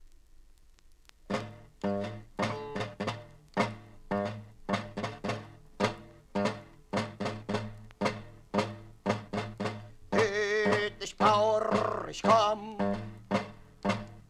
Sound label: Song to the Drum Beat